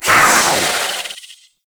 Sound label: Kavat Vocal Attack Medium; Warframe; Warframes; Khora